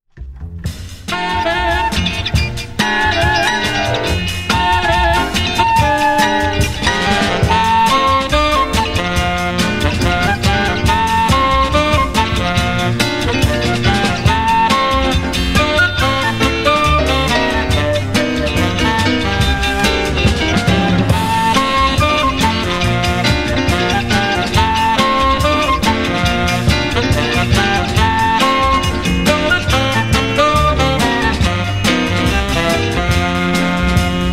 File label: ensemble